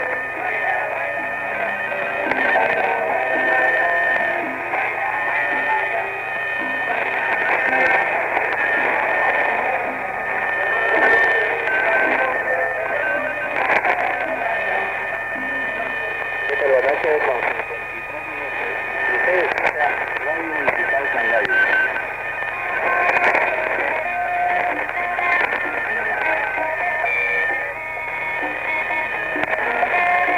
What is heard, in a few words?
peruvian cangallo, cassette; radio